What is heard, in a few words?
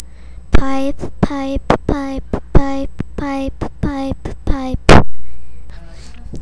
pipe